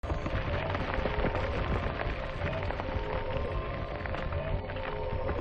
ascend

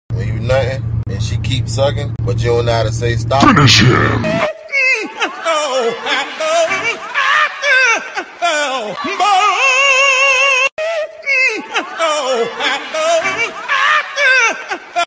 bahhhhuhhhhh

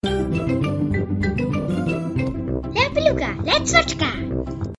beluga

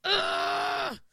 urrrr

